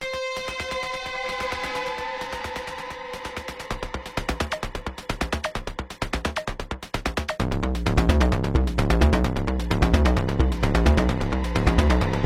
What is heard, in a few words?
Deep and Dark Techno